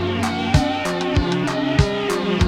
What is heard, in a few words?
loop